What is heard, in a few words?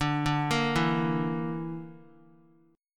D Suspended 2nd Sharp 5th D down Guitar; arpeggiated